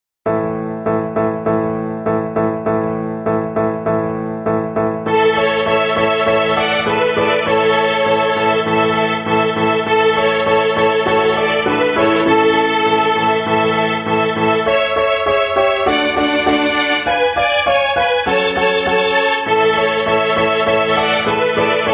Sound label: Bohemian Folk Song, Beginner Violin Groups; Gems for Beginner Violin Groups